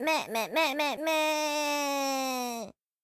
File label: deer long howl